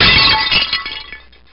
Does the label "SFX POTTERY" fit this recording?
Yes